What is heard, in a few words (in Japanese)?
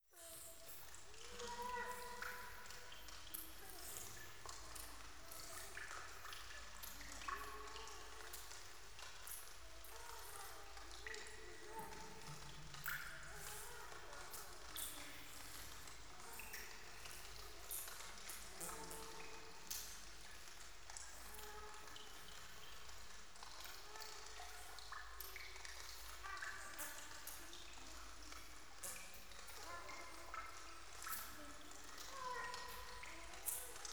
イラマチオ 雨上がり